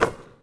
basketball net; Looney Tunes - Recess

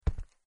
footsteps; Legends of the Hidden Temple - Unlock the Past

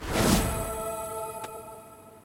sfx achievement unlocked; Ben 10 - Omnicode

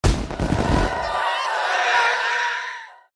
player fear activate; Adventure Time - Break The Worm; ThrillGames

